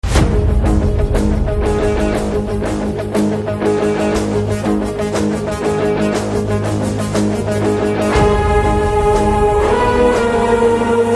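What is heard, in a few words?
Motion Poster background music; Varun Tejs Ghani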